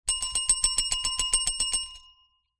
bell service desk press many fast frustrated angry